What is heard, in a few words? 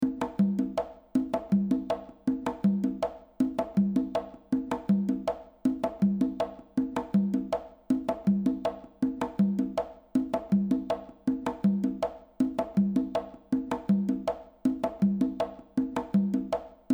reverb loop Medium Room Echo Place; Space Designer